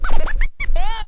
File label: turntable, Scratching and Turntablism